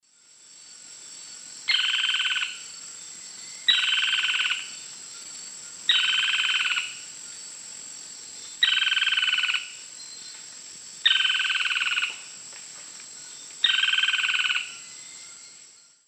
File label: Automolus infuscatus, song; S. America; Eastern Lowlands